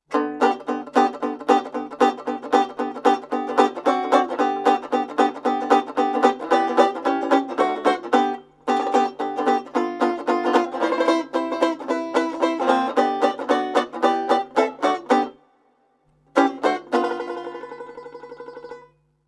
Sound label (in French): banjo